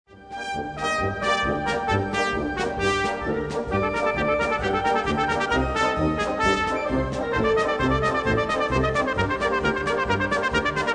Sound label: Czardas